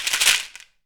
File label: SHEKERA, African perc; AKAI S6000 CD-ROM - WorldSounds